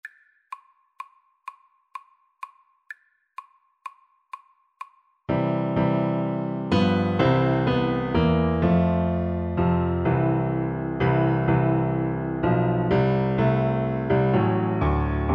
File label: heaven christmas tree BN, Bassoon